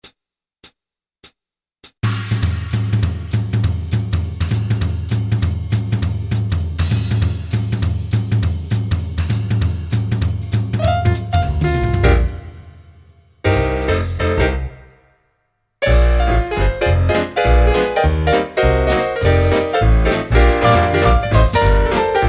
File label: Instrumental version; Catch The Reading Bug; library 2008